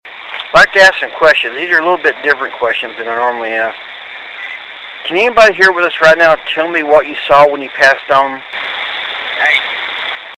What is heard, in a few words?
ghost box